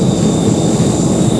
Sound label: JET; TORNADO